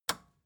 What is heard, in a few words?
record player power push on